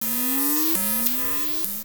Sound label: teleporter; metal bullets